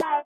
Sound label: SITAR; Spectrasonics; Metamorphosis; INDIATEK